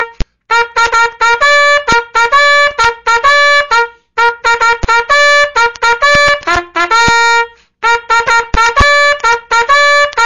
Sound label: militar